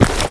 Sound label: player step